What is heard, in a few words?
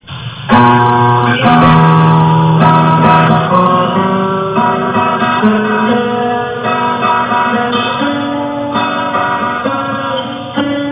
prelude, guitar